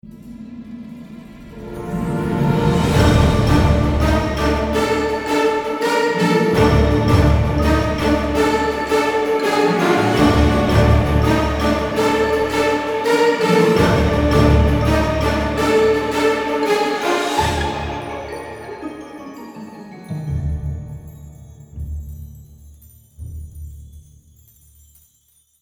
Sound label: alternate wolf theme